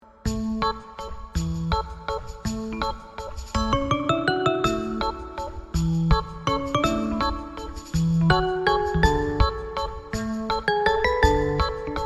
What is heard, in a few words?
calendar music